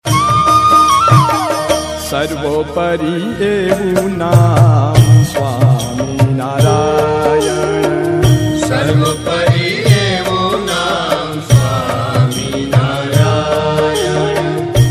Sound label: Swaminarayan Dhoon